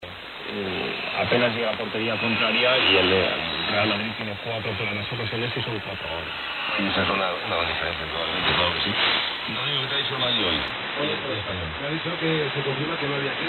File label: Radio Exterior Espania 15110khz